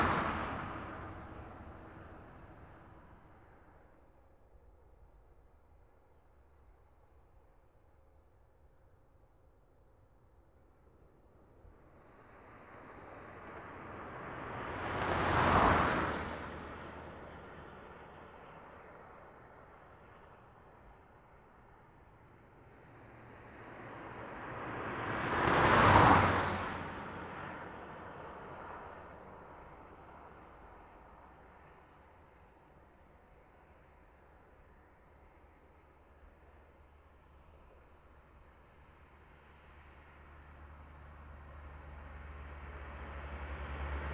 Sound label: Country Road Passing Traffic